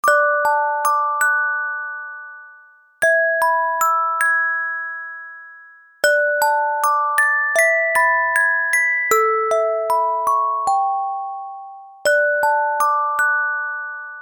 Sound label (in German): Orgel Ver.; Lucky Romance; Korea